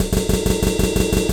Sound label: Groove 180bpm; GroovePizza